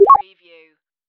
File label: future